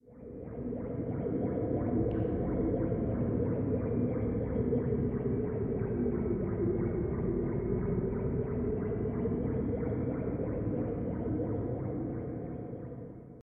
telekenesis

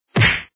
Death; the worlds hardest game online gamerip 2008

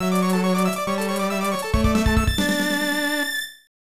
Found Item; Inindo Way of the Ninja; Super Inindo Datou Nobunaga; snes

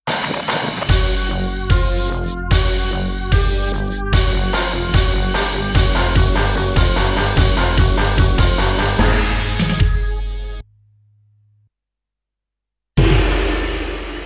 Continue GAME OVER; Art of Fighting 2 Ryuuko no Ken 2

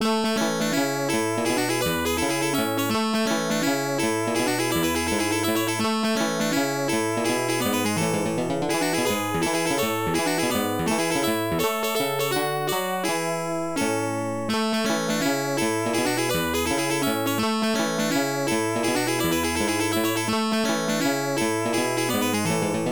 Goal Music; Hang-On; arcade

